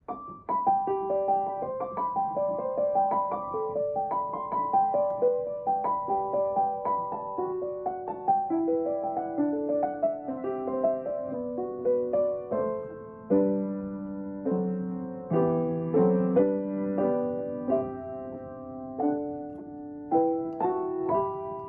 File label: piano diary